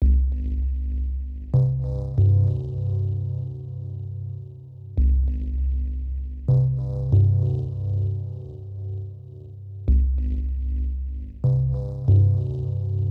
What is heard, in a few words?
Bazille